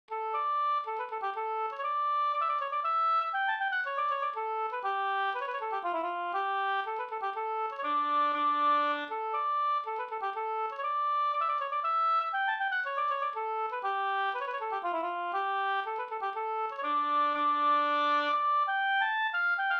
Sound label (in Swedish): polska Bingsjopolska moll; Gladlaten e Pekkos Helmer